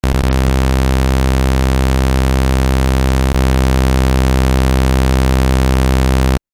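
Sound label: minimoog 1 oscillator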